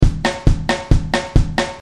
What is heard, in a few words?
drum